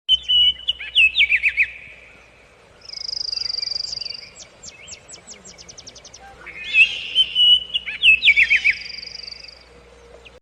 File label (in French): bruits des oiseaux et singes traversant la riviere foret; video courte sublime; relaxation